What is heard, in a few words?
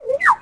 call; Dino